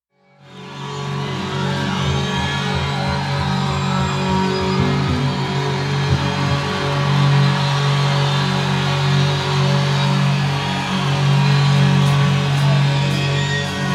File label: LA Drone, Long Beach Arena